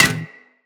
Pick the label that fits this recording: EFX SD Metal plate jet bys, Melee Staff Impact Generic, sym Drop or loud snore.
Melee Staff Impact Generic